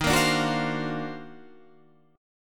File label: D sharp Minor Major 13th D sharp, down, Guitar, Open D